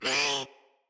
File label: Vocal Pain; Warframes; Khora